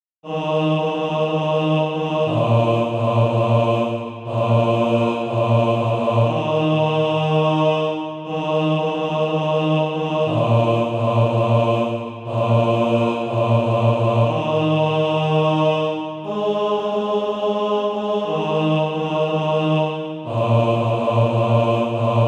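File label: bas, Practise Music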